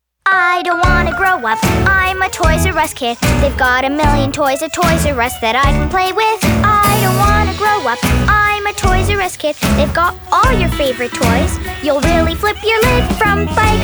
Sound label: kids singing